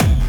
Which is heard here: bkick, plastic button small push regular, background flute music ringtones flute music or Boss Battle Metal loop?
bkick